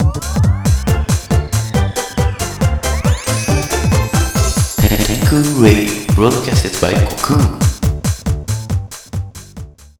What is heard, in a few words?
jingle